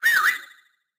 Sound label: cowboy whistle; Fight Knight